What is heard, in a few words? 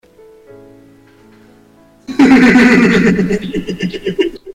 piano hehehe